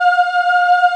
F4 women ah, Choir; USB Soundscan vol.28; AKAI; WOMEN AHP

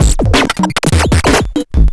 grind, EMU XL-7

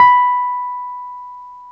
PNO Wurly

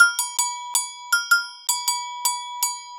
Agogo Bells

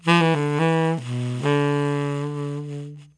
mellow, tenor sax

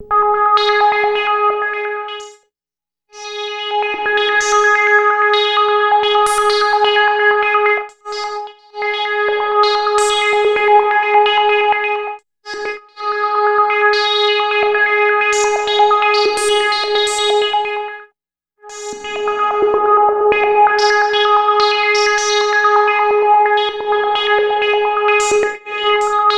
ORGAN SYNTH; InVision Interactive; Keith Emerson

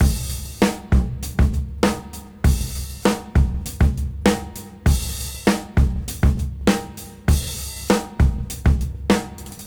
Break 99 BPM; AMG; Keith LeBlanc Phat Butt Jams

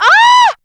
SEX; Zero G; Man Machine

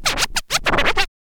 scratch kit, vinyl; Masterbits; Vinyl Attack

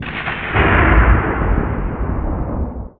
THUNDER; Best Service - XXL Production Effects

